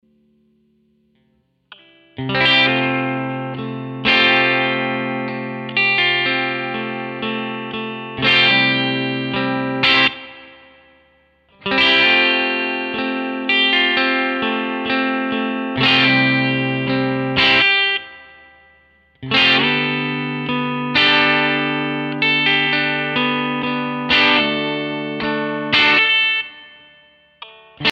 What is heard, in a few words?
Bridge, guitars